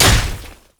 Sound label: basicattack human; LoL; shyvana